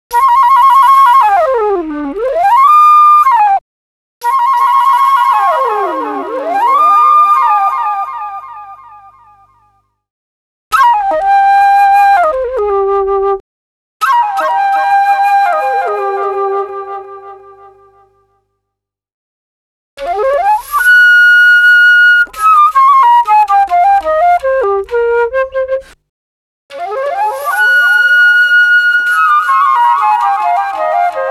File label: breaks, Jungle; Zero-G Jungle Frenzy 2